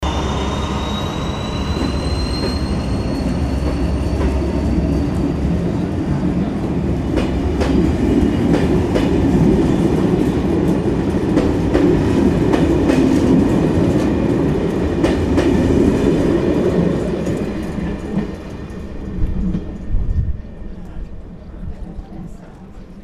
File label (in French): le cevenol depart langogne